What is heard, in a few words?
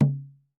COCHITI, American Natives; E-MU Formula 4000 Series Vol. 4; Earth Tones